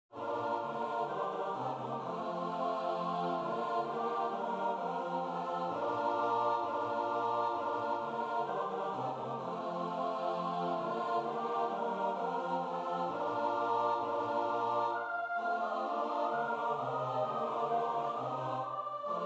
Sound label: satb; ding dong